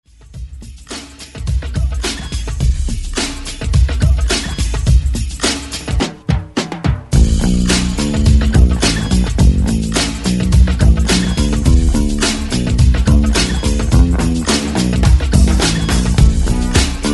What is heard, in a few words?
70s Re Drum 106 Bpm; DJDOLLS